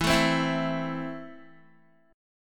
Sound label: E Suspended 4th Sharp 5th, Guitar; 11,12,12,9,9,x; Esus4#5 strummed